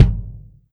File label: normal hit clap